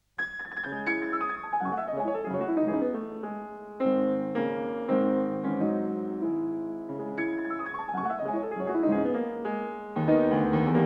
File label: Prelude in C sharp minor, piano